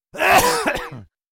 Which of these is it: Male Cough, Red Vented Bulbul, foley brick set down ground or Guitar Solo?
Male Cough